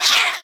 Monster Rabbit type Attack

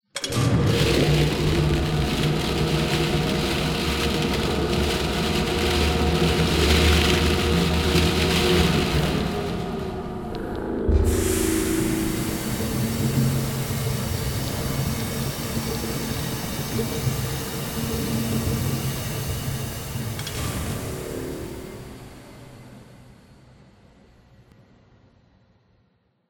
plastic ball machine

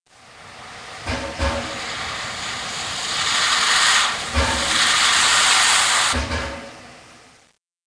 Steam Release; Learn to Fly 3

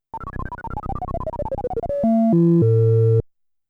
SFX Miss; Donkey Kong; arcade

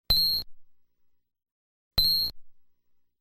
Opening Title Fusion Sound; Kaijuu Densetsu The Tale of the Shell Monsters

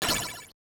Bone hit; Gradius; MSX SCC

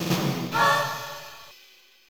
Round Clear

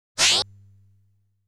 Boss Fires a Bullet

